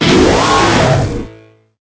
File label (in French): Cri Aigüe